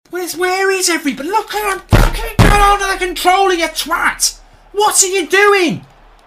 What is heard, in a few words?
bang desk rage